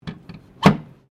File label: Door Pivot Wooden Cabinet Small Close; Various doors; Miscellaneous Doors